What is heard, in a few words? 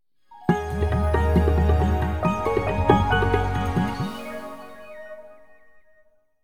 KDE Startup